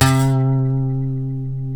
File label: D, funk guitars; Spectrasonics; Hans Zimmer Guitars VOL-1; TAKA FUNK